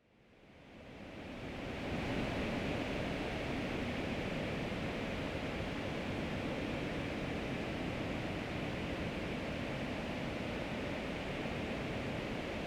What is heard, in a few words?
delay